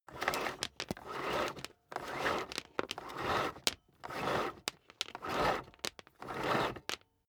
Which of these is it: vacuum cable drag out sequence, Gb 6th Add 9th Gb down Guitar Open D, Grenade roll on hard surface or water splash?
vacuum cable drag out sequence